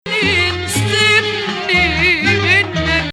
Nahawand, maqam; Rast

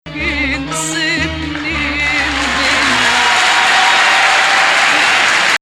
Rast, maqam

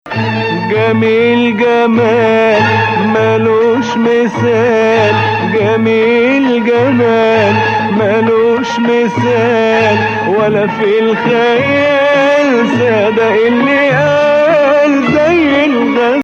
Nawa Athar Gameel Hijazkar

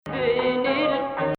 Rast, maqam

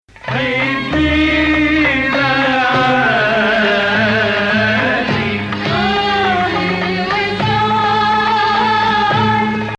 Hijaz, maqam

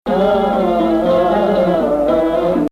Hijaz, maqam

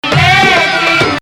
Nahawand, maqam

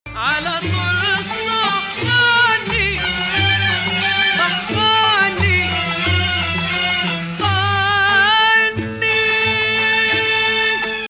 Bayati Rast - Bayati Octav, maqam

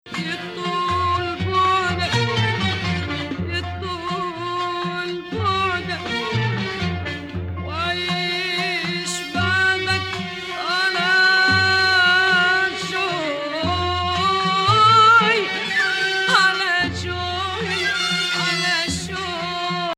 Sikah Beladi, maqam; Rast

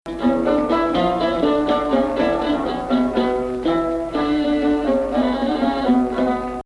Bayati, maqam

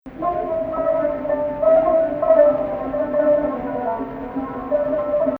Rast Rast Octave

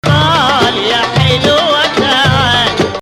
Rast, maqam

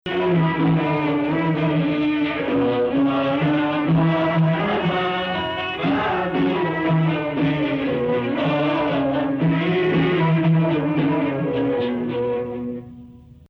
Rast (Sazkar), maqam

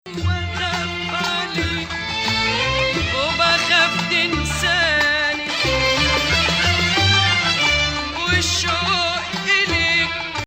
Bayati Nahawand, maqam

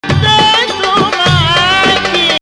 2nd Rast, maqam

